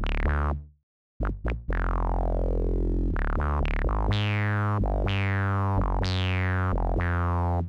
Bass synth squelch C, 125; PowerFX - Nu Jazz House

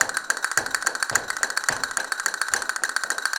CASTANETS, Flamenco

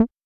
Tom Hi

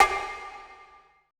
CONGA R; BPC DRUMS

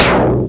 Vilonter Snare, MultiMoog

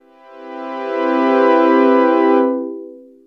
Strings, dx7

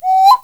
WET, PERC, Percussionworks; Sampleheads; NYC Percussionworks; 90 s Sample CDs

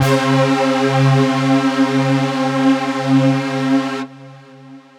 Synths Note C

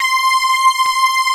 SYN STRGS, JV 1080; Pro-Rec - Synthsonic

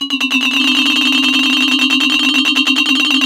childs play; East West; Global Sounds

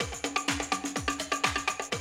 125, Classic Drum Loops

